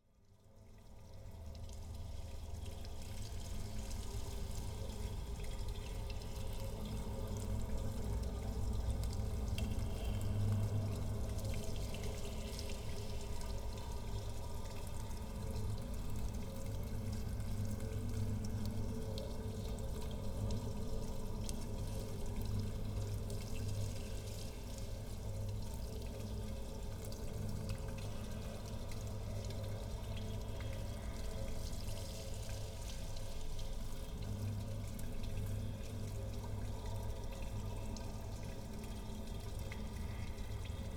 Sewer Streaming Water, Underground Light Eerie Room Tones; Hollywood Edge; sound designer's toolkit v2